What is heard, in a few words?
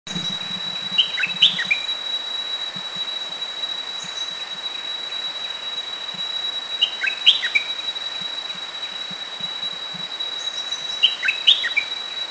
Stripe-throated Bulbul